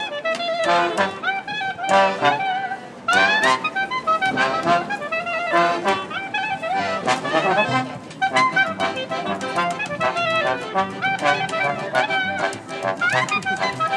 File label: amazing klezmer band in spitalfields